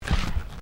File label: Footsteps Ceramic Tiling Step; Crocs; Footstep Foley; Footsteps (Sound Effects:Human)